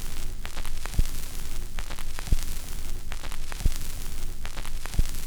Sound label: CRACK, CRACKL FX